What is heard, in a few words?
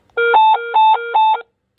Radio Tone